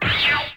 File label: Blast